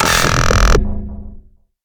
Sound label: Take Damage; Raypunk